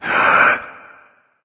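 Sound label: nonverbal breath; smite; voicepack; ullr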